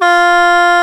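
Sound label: F2 SHENG, CHINESE SHENG; AKAI S6000 CD-ROM - WorldSounds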